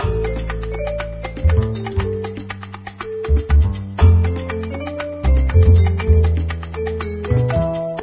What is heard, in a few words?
Mexican Music; Diner Dash 2 Restaurant Rescue